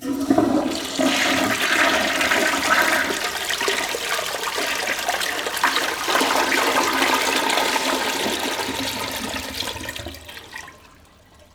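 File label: W.C. FLUSH, TOILET; AKAI; 1000 Sound Effects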